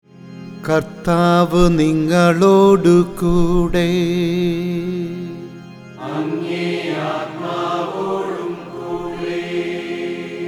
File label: Chant; Karthavu Ningalodu; ordo cantus